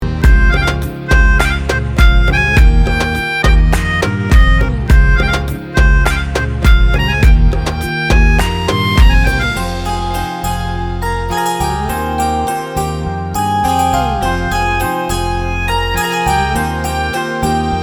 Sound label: instruments music